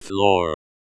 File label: floor